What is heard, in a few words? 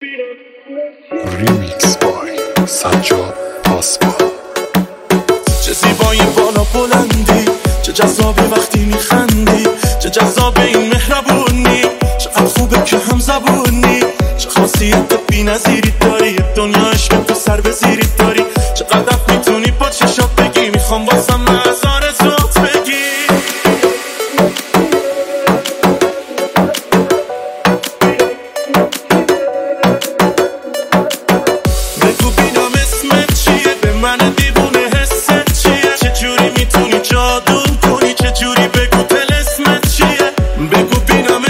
remix